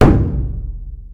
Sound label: normal hit clap; osu!